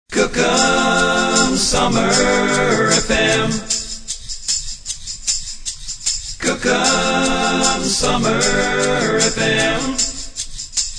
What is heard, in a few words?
vox and perc; COOKHAM SUMMER FM